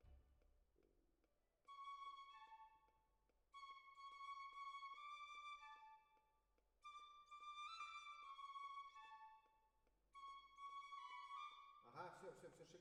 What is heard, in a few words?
Cellos